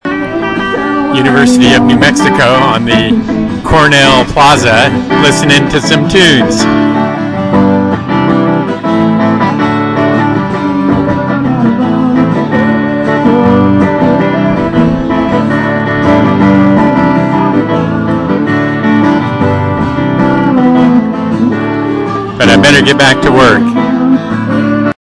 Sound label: outdoor music